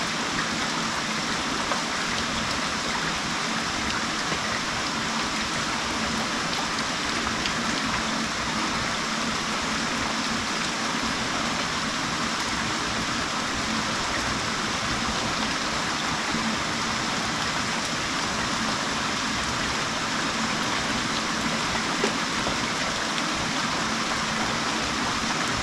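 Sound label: ambience rain; rain loop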